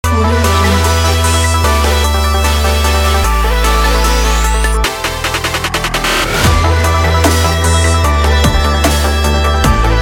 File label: stylish future bass